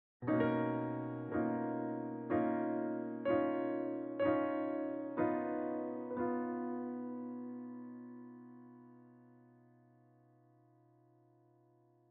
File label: Piano; Factory Boss Piano12